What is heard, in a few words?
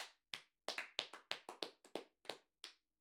Applause Of Four People In Room Applause Of Two Persons